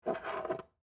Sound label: Skeleton Key Up, Pick; Foley; Jangling (Sound Effects:Objects); BlastWave